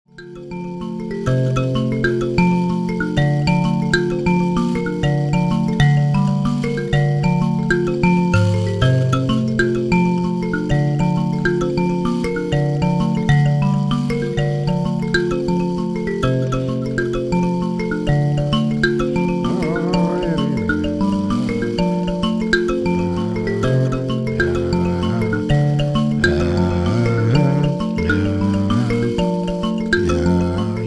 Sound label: mbira